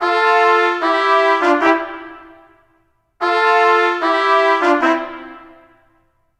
Clarion Trumpet, reggae; регги

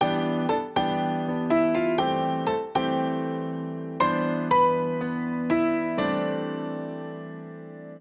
Solo Chord; Jazz (Джаз)

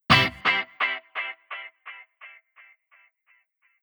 Dub Guitar, reggae; регги

